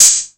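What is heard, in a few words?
808 open hi hat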